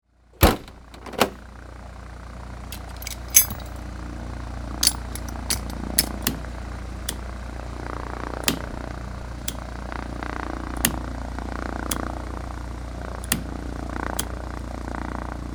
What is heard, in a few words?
Helicopter interior starting sequence pushing buttons